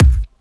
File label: raw kickdrum sample C key